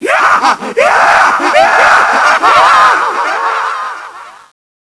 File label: maniac